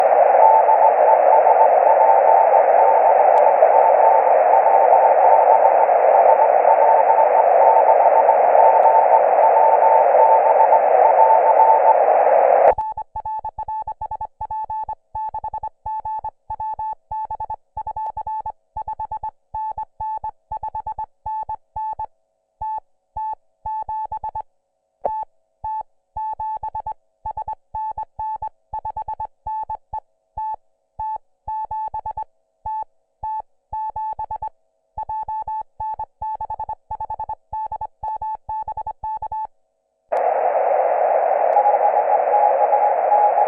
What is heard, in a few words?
CW; SP6GWB